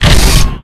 Weapons Rocket Launcher

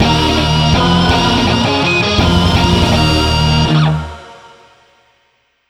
Clear Jingle; KARASU MELTDOWN!

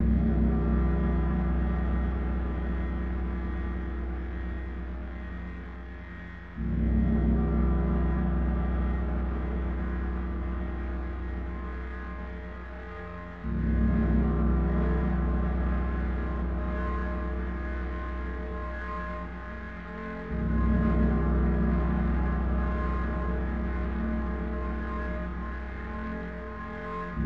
gong intro; transformers war for cybertron gamerip; IACON IN RUINS

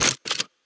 Weapon Reload

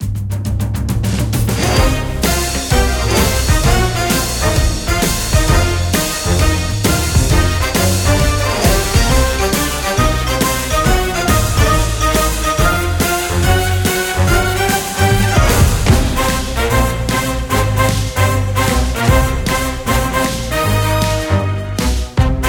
Boss Super Swing; DeadBird

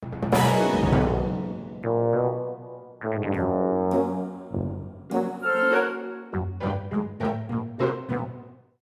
Combat Ending; Monster Life

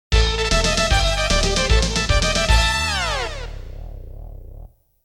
Defeat; dolucky's sandlot baseball

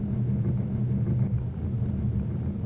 metal slide hard

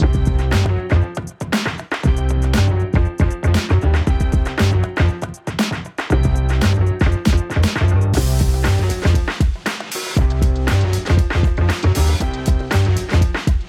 90 hiphop 118bpm; hot lava; ios macos windows

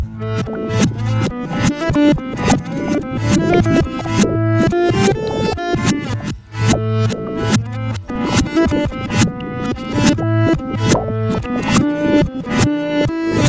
Loop Reve; carte blanche for a fistful of teeth; windows gamerip 2006

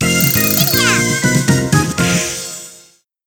Battle Mode - Level Clear!; missland 2 ps1 gamerip 1998

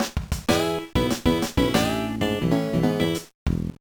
Jingle, gba; Backyard Baseball 2006

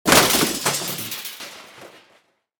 Press

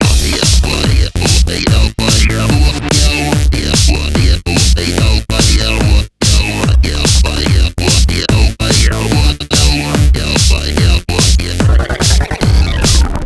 Boss Music; Shatter

